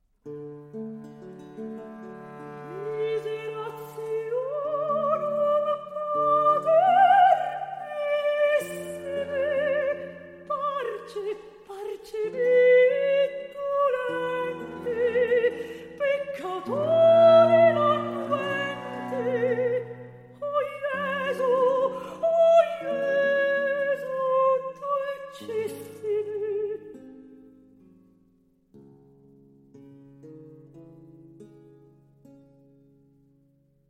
Motet for Soprano, 2 Violins, Viola & Bass, Recitativo; Vivaldi; In furore; RV 626